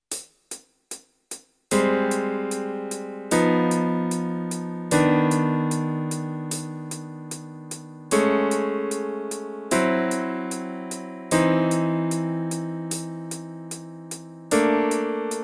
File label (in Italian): fisarmonica accordion, ii v i minore; Pillole di jazz… I Voicings – Lezione n. 5